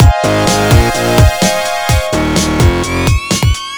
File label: 127 bpm full, combi loops, nu dance electro; Zero-G; Soundclash; Acidized Wavs; Southbeach Infusion